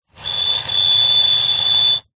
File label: plastic small item scrape screech metal; foley